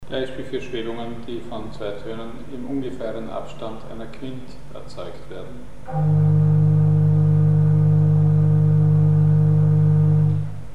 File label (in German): Schwebungen entstehend durch Frequenzen im verstimmten Quintabstand; Mikrobuch